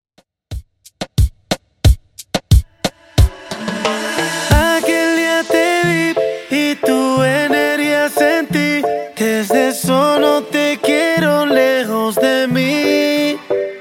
Xtend Redrum 90bpm

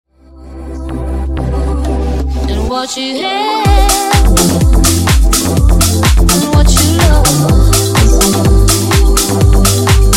125 Bpm

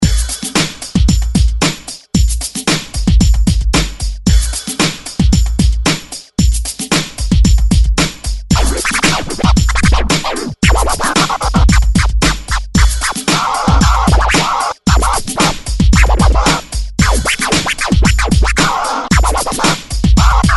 SCRATCH LOOPS 113BPM; DJDOLLS

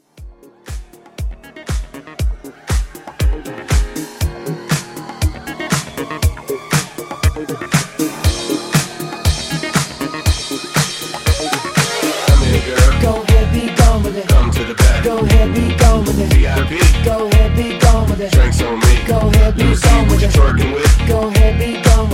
Blend 119

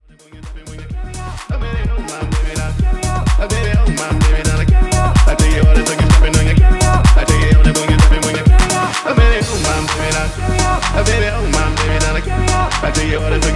Tech House; Remixfreakz; Grenno Remix

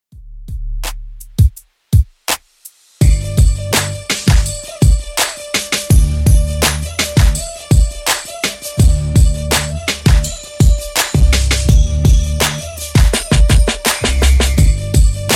2ks Redrum 83bpm

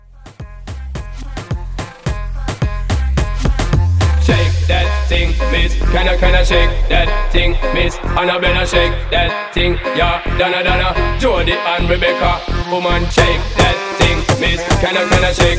Moomba Mashup 108

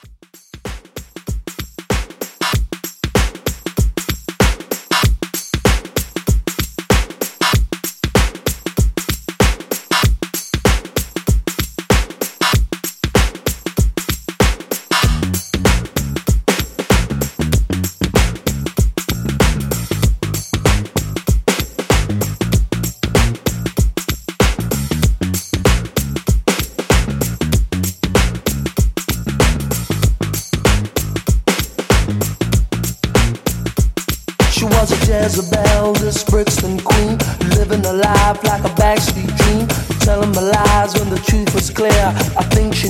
90s Funk, 96Bpm; Redrum

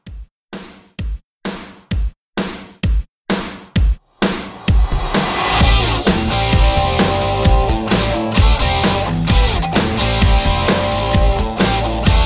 70s Rock Re Drum 130

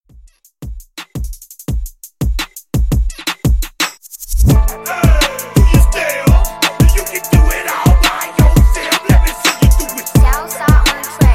Hip Hop Hype; Redrum

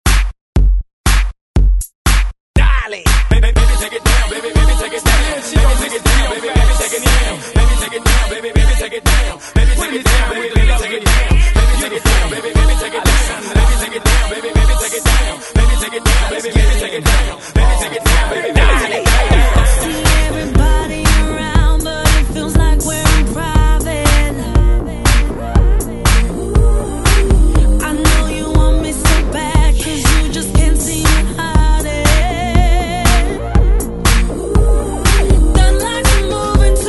Hype Re Drum 120Bpm; DJDOLLS

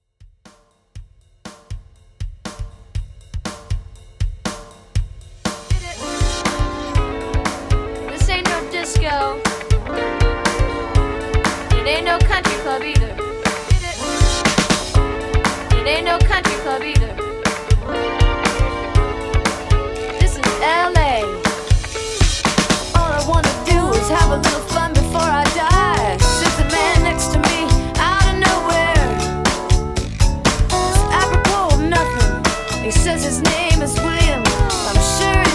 Pop Rock Redrum 120 Bpm

